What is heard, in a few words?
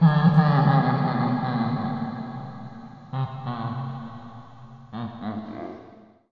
laugh; fredbear